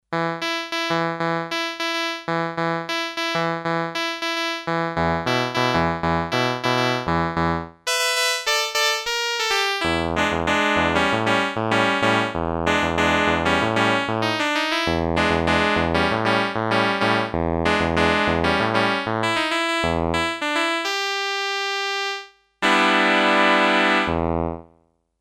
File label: Clav like w slight release, Sequential Circuits Six-Trak; Factory Programs